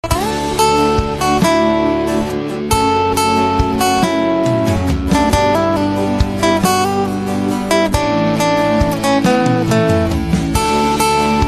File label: guitar cover instrumental ringtone; kabir singh